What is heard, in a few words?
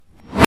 Riser